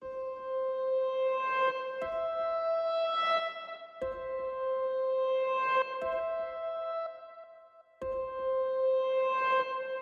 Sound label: Divine Drone Ambient